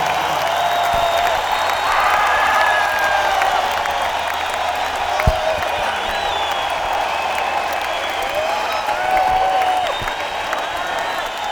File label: Encore Break; sony ecm270